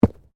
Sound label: Bodymovement Ground Impact Heavy; Body movement foley to simulate falls and deaths; Wrestling And Struggling Sound Effects